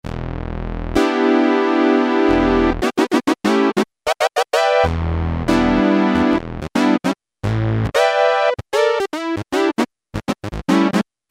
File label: AUTOBEND, Kawai SX-240